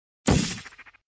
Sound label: portal gun fire blue; portal 2